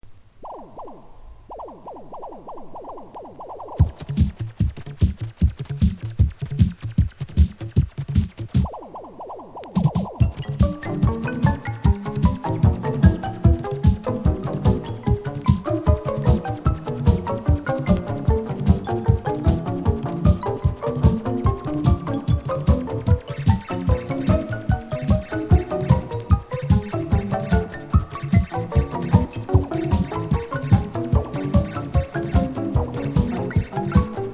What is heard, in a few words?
synthesiser sound